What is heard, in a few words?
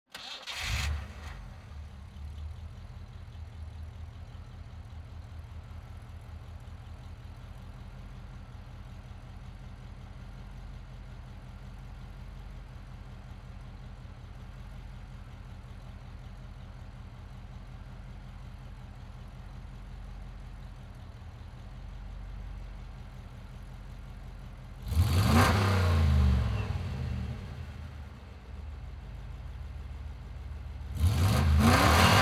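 Pontiac Trans Am Exterior Start Idle Blips Off; Pontiac Trans Am 1984; Pole Position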